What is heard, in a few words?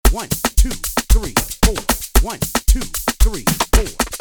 african amapiano praise loop 114bpm